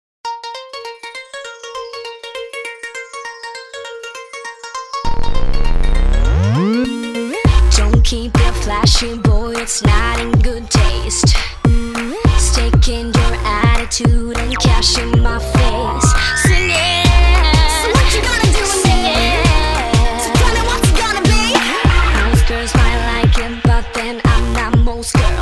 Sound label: Clap Clap